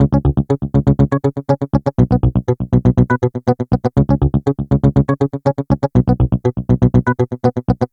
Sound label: Muted Guitar, Guitar Synths; Dr. Fink's Funk Factory